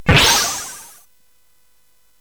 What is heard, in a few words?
SFX; Narco Police; hvsc